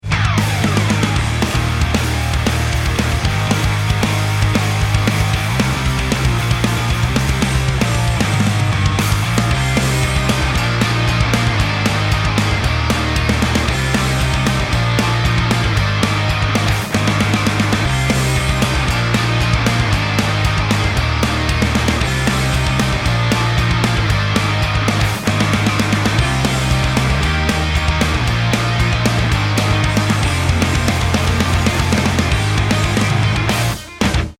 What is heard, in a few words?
bomb planted, music